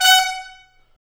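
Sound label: brass trumpet stab; Roland L-CDX-03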